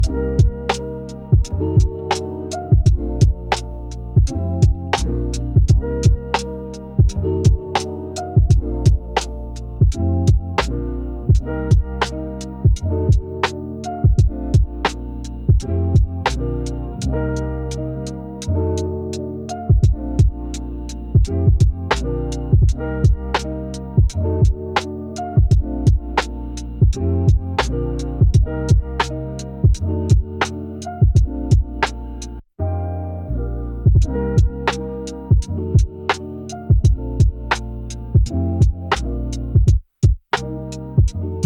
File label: Cozy Keyboard Clicks, lo-fi